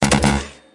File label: 8 bit blast